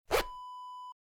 jeans zipper

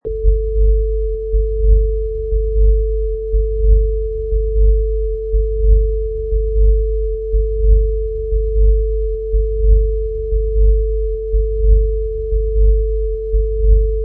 Hells Heart Bass

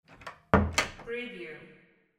door close

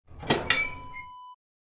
wood burning stove door open

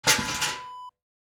garbage can lid close

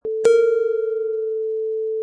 Dong

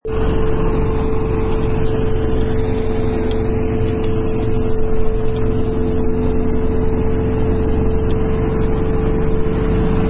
Golf Cart

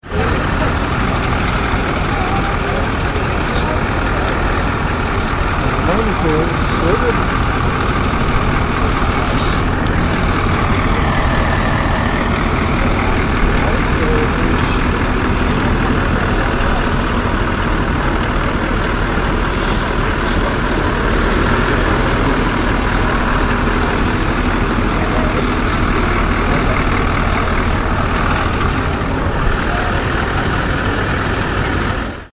Dubrovnik Bus Stop

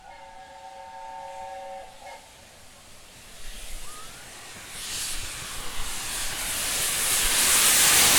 tornado

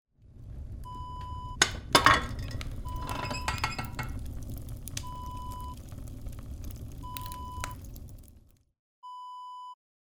wood burning kitchen stove door open

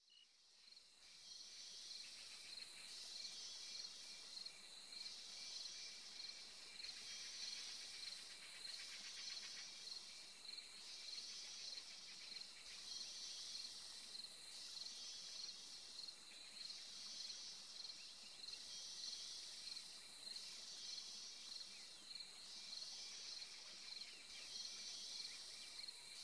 Indian Rainforest

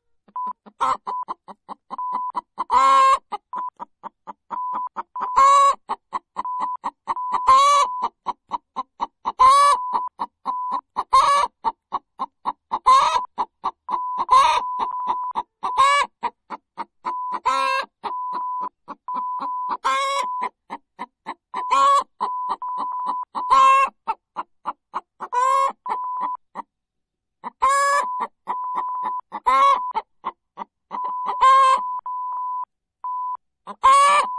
Chicken Cluck Egg Lay

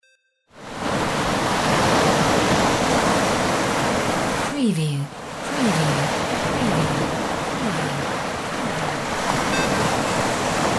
SEA ROUGH; windy beach audio

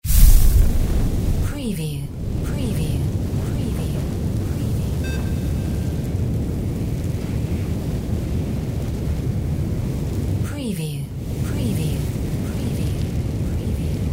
sci fi fire spell full no drone; windy beach audio

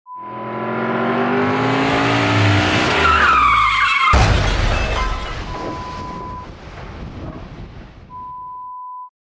Car Crash

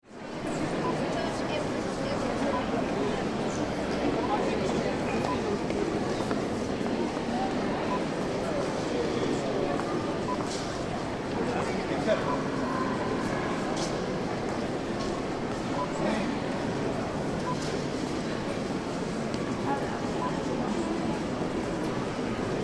Museum Ambience London

